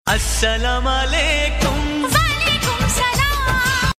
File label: New Sms